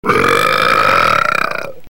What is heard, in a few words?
brrrp